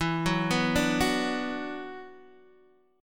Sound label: E 7th Suspended 2nd, down, Guitar, Open D